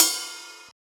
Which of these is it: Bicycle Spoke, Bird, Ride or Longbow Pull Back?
Ride